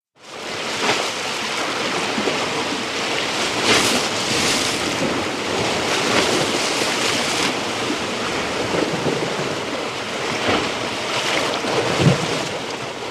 Med Surf Churning

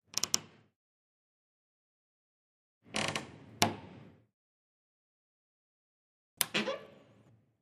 Door Wardrobe Creak Th; hinges & creaks

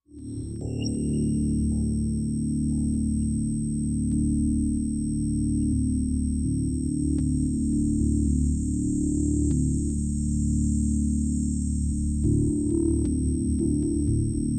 Broken Tuner Low Drone H, energy beams

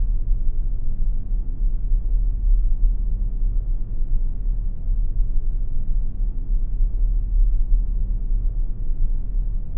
SPACESHIP AMBIENCE

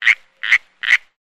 Frog California Tree

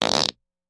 Close Up Farts

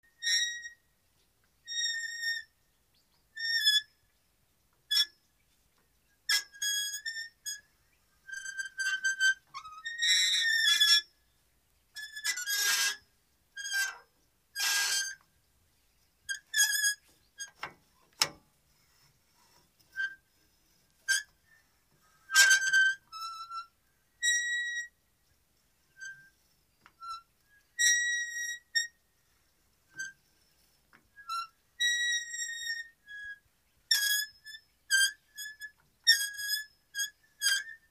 Metal Squeaks Smalls, hinges

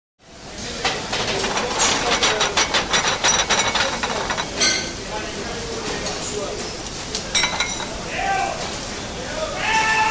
Hotel Kitchen, muslim arab

